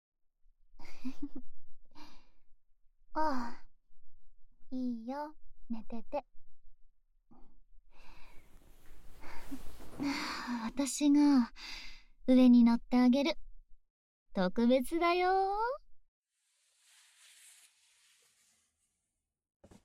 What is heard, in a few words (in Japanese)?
布団の上で耳舐めエッチ